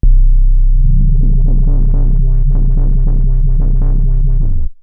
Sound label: Roland B C1, Roland S100 synth; musicradar-synth-samples